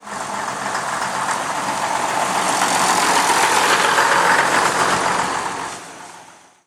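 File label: leyland leopard, bus; Simutrans; Pak128.Britain-Ex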